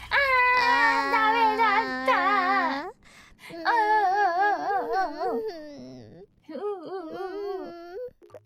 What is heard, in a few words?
crying about it